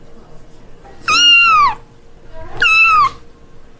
Cat Message Tone

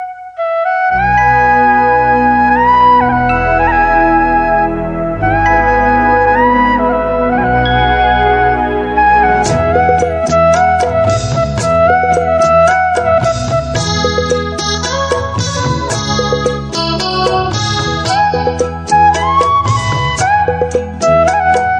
Tamil Romantic

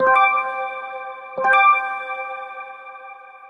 Samsung Galaxy Y Pro Default Sms

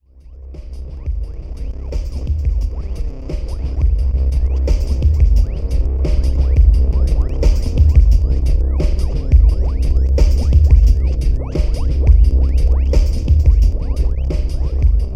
Abstract Breakbeat Ringtone

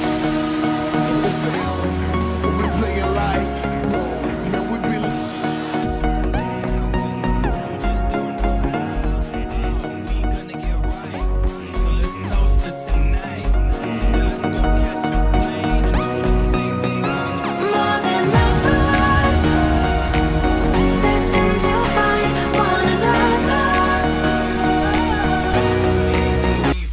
Samsung tune

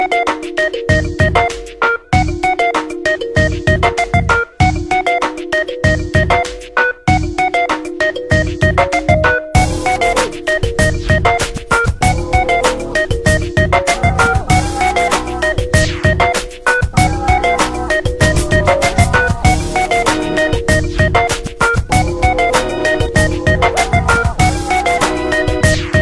Electronic Instrumental Ringtone

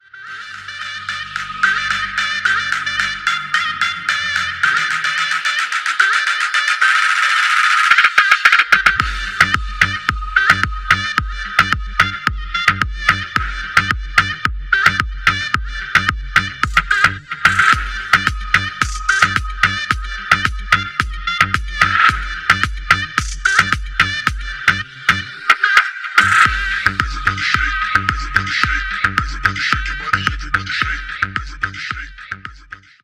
Shenai

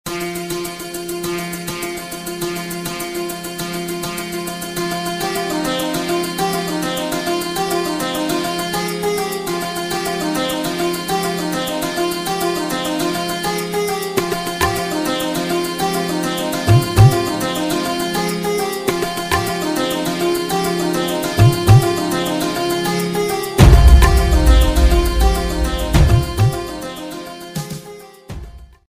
Sad Instrumental Ringtone

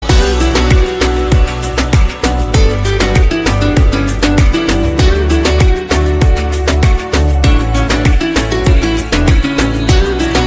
Huawei Original Ringtone; Huawei Mate 30 Pro

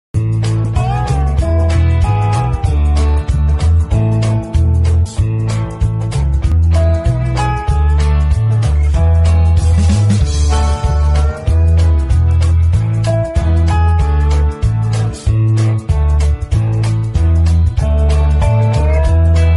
Infinix Ringtone; Infinix Smart 8 HD

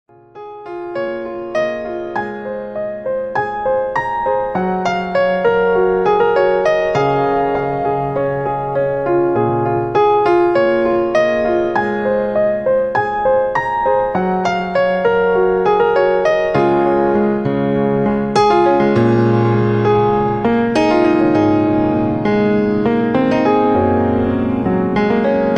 Piano Ringtone

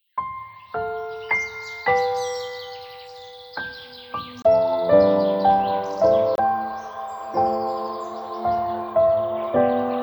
Dawn Chorus Ringtone; Samsung S3

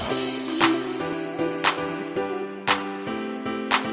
galaxy A5 sms ringtone

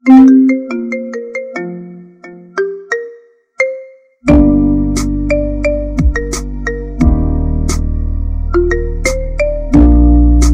Marimba Remix iPhone 13 Ringtone

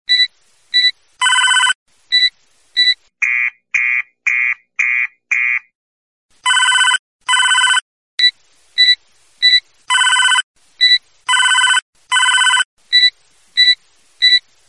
Samsung Alarm Mix Tone

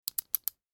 iPhone 11 Max Notification Ringtone

